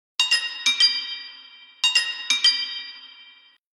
Chirp, ringtones; Sony Ericsson W705

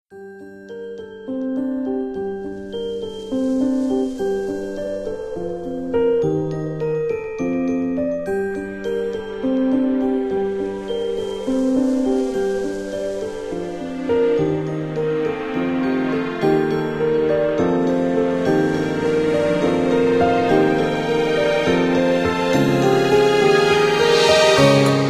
Chasing Wind, alarms

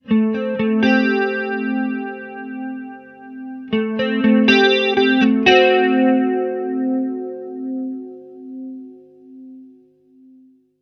Soft Rock, ringtones; Marshall; London KB 1501

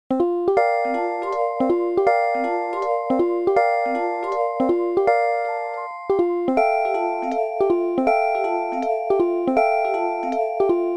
Airy clock alert; Nokia

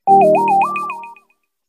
We Can Whistle, notifications; Tecno HiOS 16

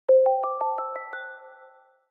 Ringtone; Sharp; AQUOS sense4 lite SH-RM15

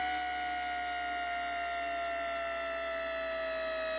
Camera Zoom Out; Samsung SGH-F300

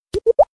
Drop, notifications; LEAGOO; M9

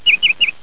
BIRD; LIVEPAPER; Spreadtrum source code audio

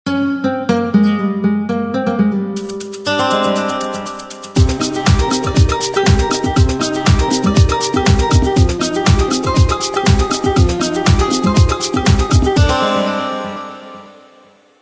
Grooves Smooth Latin; BlackBerry; OS-4.6

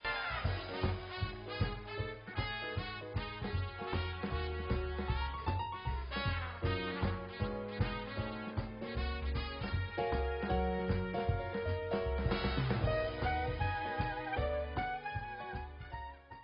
Musical; KM555e Clubby; LG; Muvee Studio

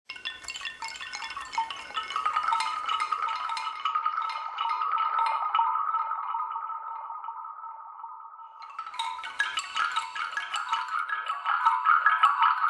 Windows Mobile ringtones; Microsoft

